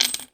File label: coins table